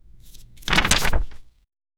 page turning sound

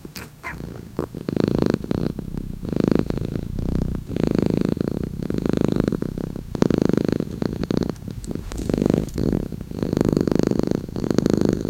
cat cant stop farting

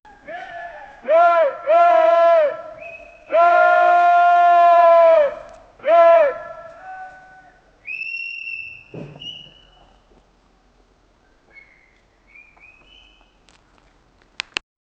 the sound of elephants tr

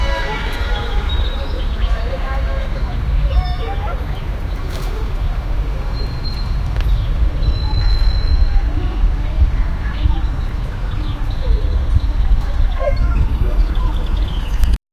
dubai park background sou

